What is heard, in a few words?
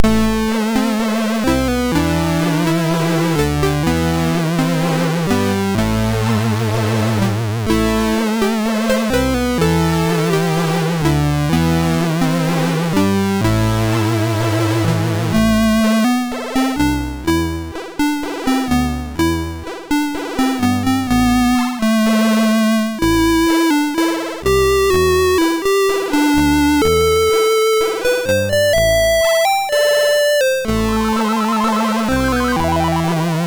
MOS6581R2, sid